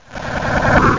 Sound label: SD DEATH; COLLEX